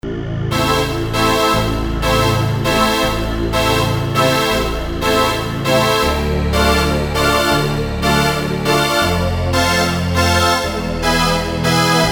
D-50 Steamvents

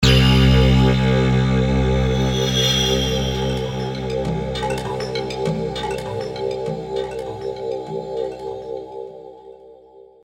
D-50 Digital Native Dance

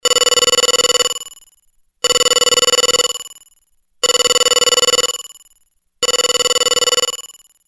FB01 Calling

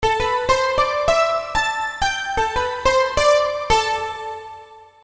XV2020 Iceprick

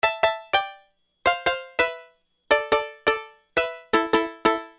Plucky

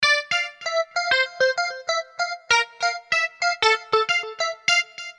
XV2020 Reptile Lick

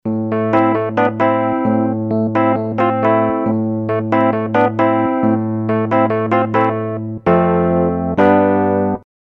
M3 Electric Piano

